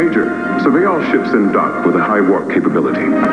high warp; ShareWare OnLine Volume 2 (CMS Software)(1993); music

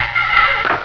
Door Creek Open; The Haunted House 1.0.2

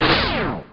explosion small; StarSiege

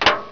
bullet hi

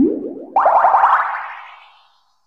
AMBNT 160; Ambient

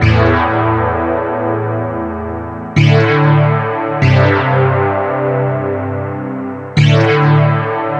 PAD; GrooveMaker demo; Serious Software; Macworld 1999-04

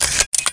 bullet up, ammo

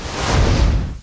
CRASH; Barrage

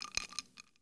amb lounge misc; Earth and Beyond; EA Games

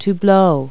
blow; Multi-Lingual Talking Picture Dictionary

